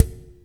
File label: WaveDrum C6, Korg Z1